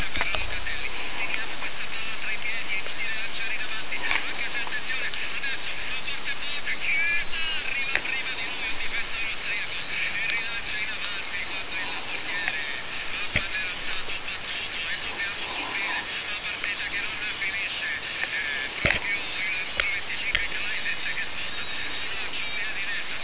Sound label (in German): MW 657 khz abends sehr duenn